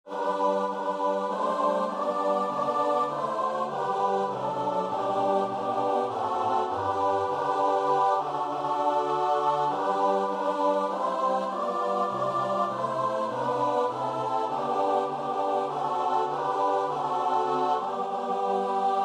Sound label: choir; joyful joyful